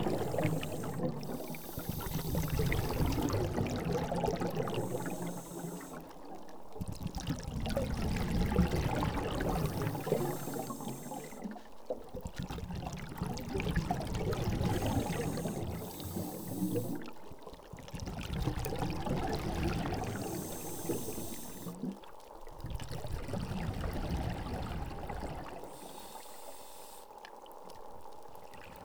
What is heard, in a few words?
Scuba Sounds